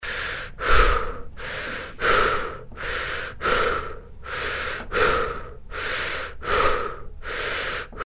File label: breath raw